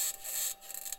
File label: mic, mars2020 edlcam